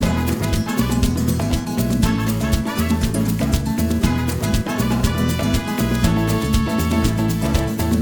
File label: Speaker Music; Villa